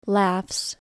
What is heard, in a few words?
laughs